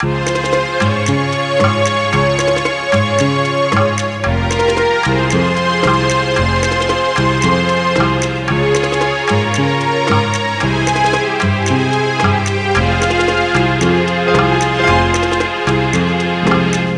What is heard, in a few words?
Tango